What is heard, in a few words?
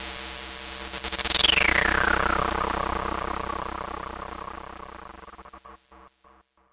Synth FX